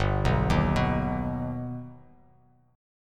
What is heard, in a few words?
G Augmented, Bass Standard; G+ arpeggiated; 3,2,1,4; JGuitar